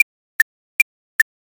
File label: ticktock